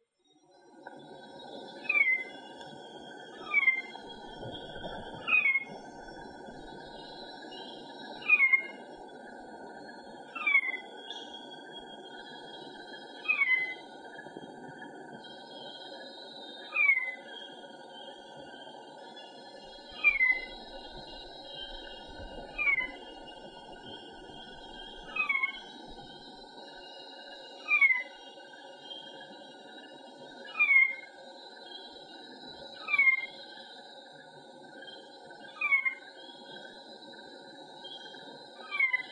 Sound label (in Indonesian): suara betina kepodang